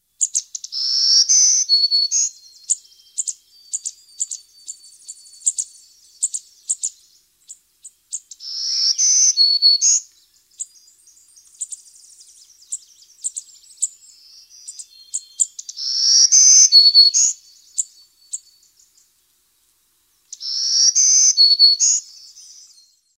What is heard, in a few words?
Bird Song Black-throated Green Warbler